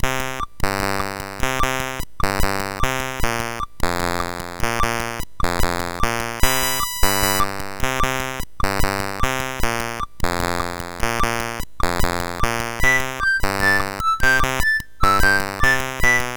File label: Bossa Nova, MOS6581R4